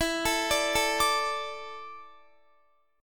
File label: E 7th Suspended 4th, Guitar; E7sus4; arpeggiated